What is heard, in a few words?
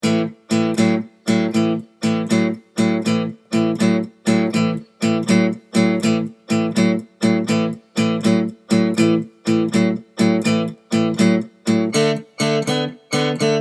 12 bar Blues shuffle in A